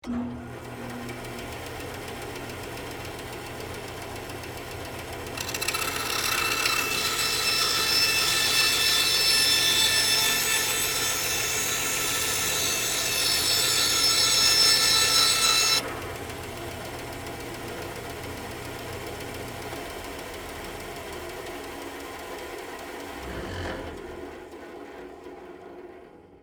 Band Saw Cut 1x4, Industry, Soft, Wood, On, Run, Off; Motor; Blast Wave